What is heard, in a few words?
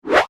multimedia max min interface sound whoosh max; Multimedia Interface ' Maximise ' Sound ( Whoosh Style Tone ); Sound Design; McKinneySound